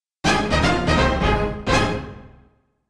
Level Complete, IBM-PC MT-32

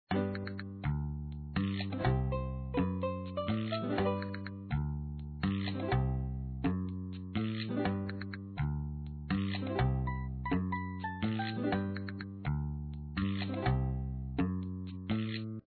other music; papa s freezeria gamerip

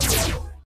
Space Shot; doodle jump 2; android

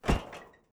Impact Metal Light; adams venture origins

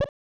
Menu Window

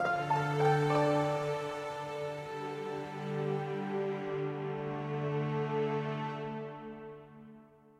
Calm Spotted; Forbidden City

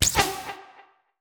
Attack Fire; portal quest android; Antihero

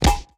Rebel Attack Impact; android; Portal Quest

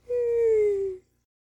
Cuddle

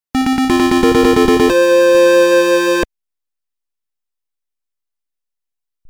Stage Clear Sound; Double Dragon (Fc)

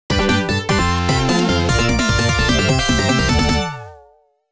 2nd Period Start, arcade

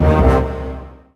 DEATH SOUND

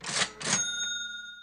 cash Register; gamerip; temple run

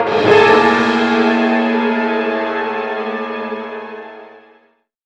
hit; clinging to hell; Left 4 Dead 2

